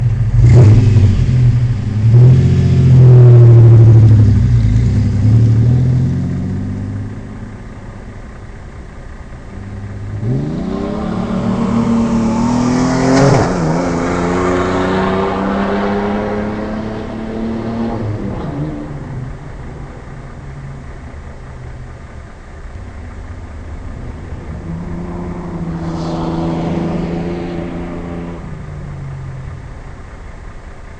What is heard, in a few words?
Ranger Flowmaster Magna Cat Heavy Throttle